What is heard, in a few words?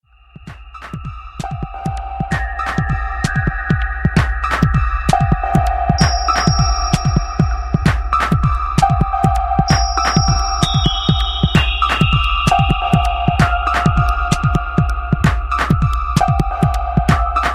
tiled eighths